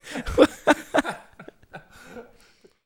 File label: PEOP laugh, party People